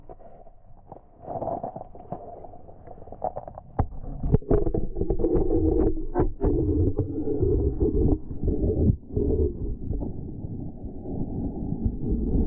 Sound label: Underwater ice saw, Ice Fishing; The Ways - Ice Fishing; Nat Sound Selects